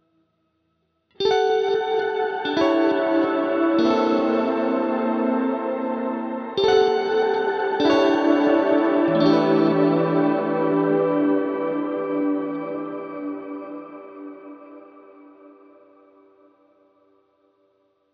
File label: WALRUS LORE Chords